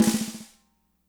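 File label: snare right; Spectrasonics; Burning Grooves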